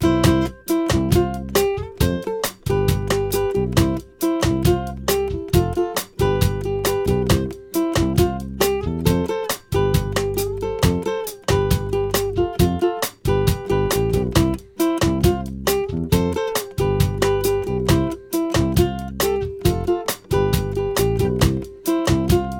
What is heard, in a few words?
(no melody)